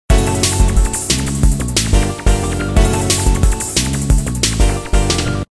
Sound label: Poppin Signal; Kyocera; DuraXV-LTE